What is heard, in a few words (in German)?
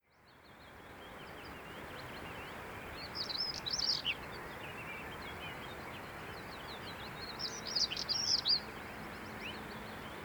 Schwarzkehlchen; Froettmaninger Heide M; Daniel Honold